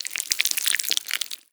flap long, zombie blind jaws; Half Life Alyx; creatures

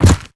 step soft, zombie blind

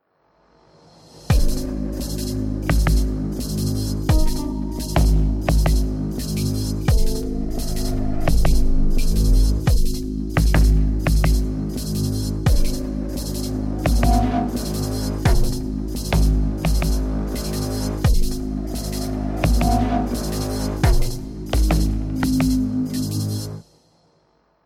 start round, music

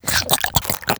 attack slobber, headcrab, creatures; headcrab classic; vox

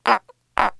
grub idle, antlion grub; Half Life 2; Episode 2

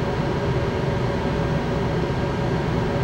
baggage escalator; ambient atmosphere; Counter Strike Model Pack; CS:GO

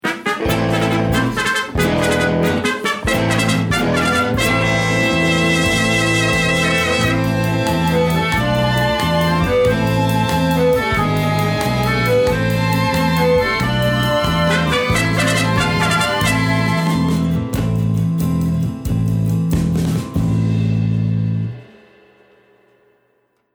choose team

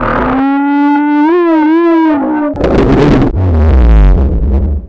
strider die; npc; Half Life 2

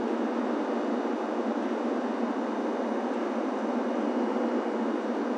office garage tone, ambient tones

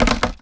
board strain; breakables

